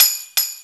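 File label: tambourine; TAMBOURIN KT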